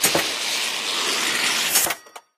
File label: Evil Spawner Heal; candy crush saga gamerip